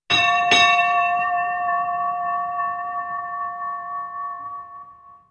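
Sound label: train warning bell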